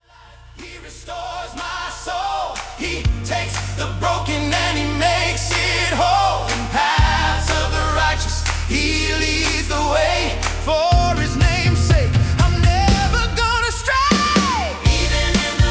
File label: pop style; Psalm 23 The Lord is my Shepherd; latin pop style